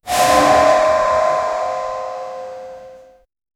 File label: deleting a big object fro, digital effects